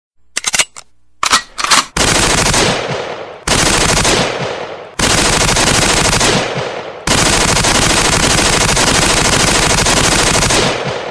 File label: Assault Rifle